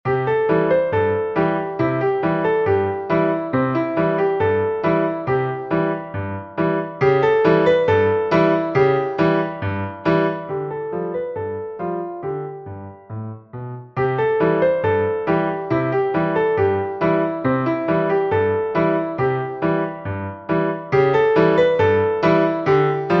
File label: Ragtime version piano